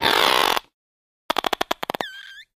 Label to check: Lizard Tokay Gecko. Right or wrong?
Right